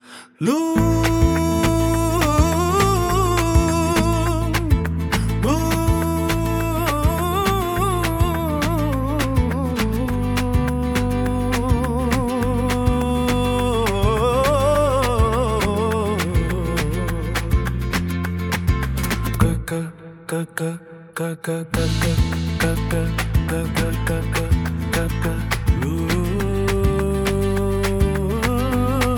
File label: flamenco